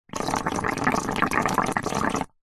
water in metal container bubbles blow through plastic flexi tube short; foley